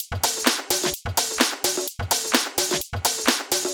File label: Minimal Loops